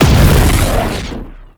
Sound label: Shred Explosion; Warframe; Temple